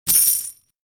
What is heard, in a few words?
coin cost